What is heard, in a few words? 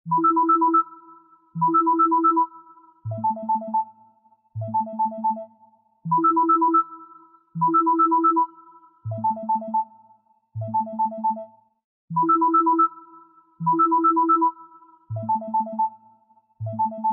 Schedule Soft Alarm; LG K83 5G